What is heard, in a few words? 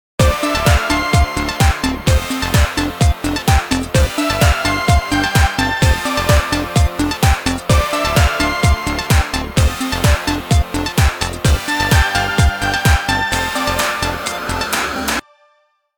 Pop diva